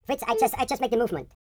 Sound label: YODEL YELL, World Voices